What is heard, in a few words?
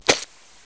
thunk